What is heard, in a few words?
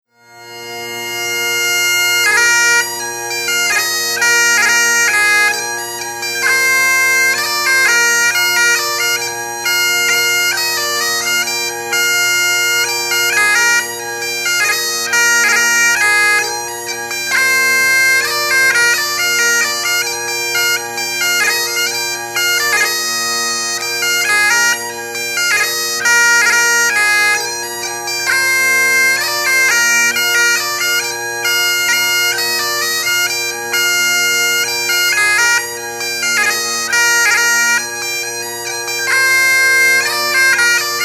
Waltz, bagpipes